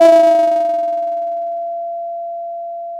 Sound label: pop, Sound of a doorbell